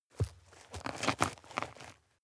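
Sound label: step dirt heavy boots scuff hard, footsteps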